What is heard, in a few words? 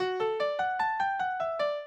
minuet, mozart